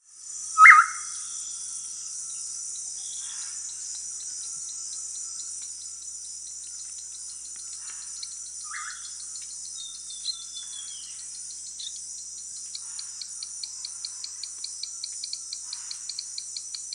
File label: Black-capped Tinamou (Crypturellus atrocapillus), Peru; S. America